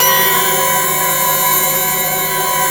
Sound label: B4 METAL; Northstar; The Wizard VOL-1